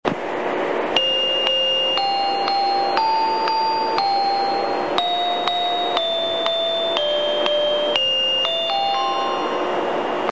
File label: train chime; きらきらうえつ; 「きらきらうえつ」で使用される４８５系のチャイムです。; きらきら星; 『きらきら星』と『ウイーンの森の物語』はこの車両でしか聞けません。